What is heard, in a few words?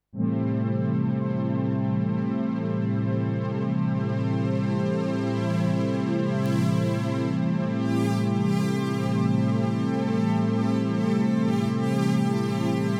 Polybrute12